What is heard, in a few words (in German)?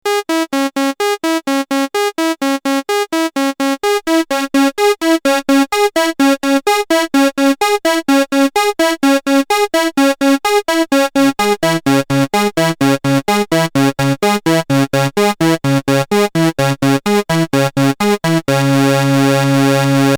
3 Sägezahnwellen